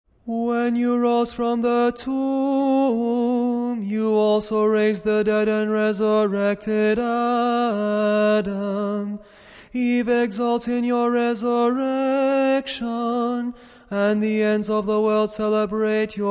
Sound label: Tone 6 kontakion